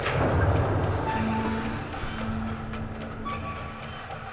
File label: mine wrk; machines